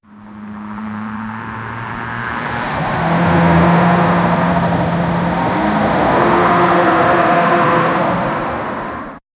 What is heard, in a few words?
car wheels on desert sand